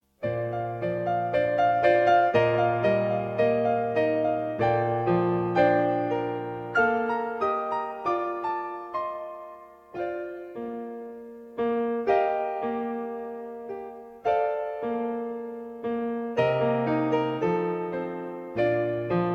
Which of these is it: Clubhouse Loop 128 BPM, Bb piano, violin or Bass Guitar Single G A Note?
Bb piano